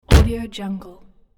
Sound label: door car shut bright; domestic sounds